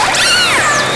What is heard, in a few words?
orca